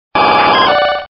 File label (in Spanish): Grito